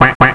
Duck Quack; Koji the Frog 2.0.1

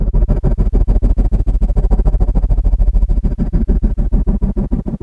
wings

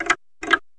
tic tac; Mots Croises Autres Jeux

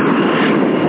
jet

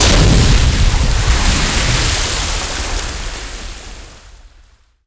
water splash

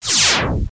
Magic; duke nukem total meltdown

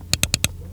CLICK

